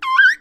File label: Whistle; HedgewarsMobile